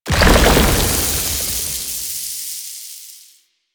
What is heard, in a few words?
Arm Collapse; Horrors; Warframe; Man In The Wall; Octopede